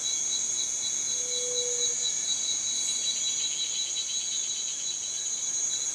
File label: jungle birds